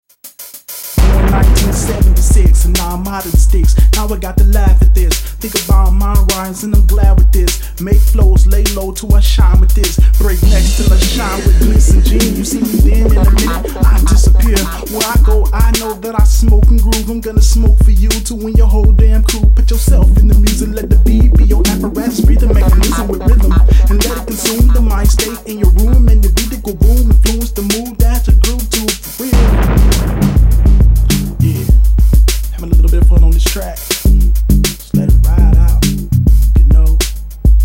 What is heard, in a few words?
hiphop